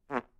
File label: poot